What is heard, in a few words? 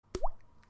rain